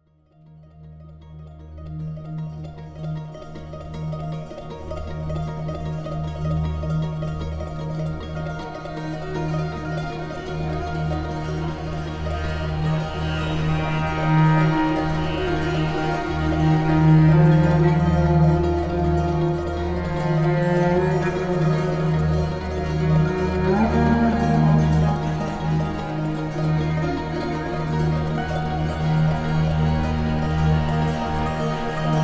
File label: Drama string middle eastern flair